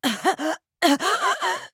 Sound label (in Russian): Кашель; Симметра